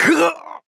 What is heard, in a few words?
Death; paladins; Torvald